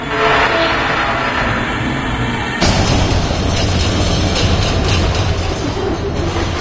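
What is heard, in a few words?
hall elevator move; Garry's Mod; hl2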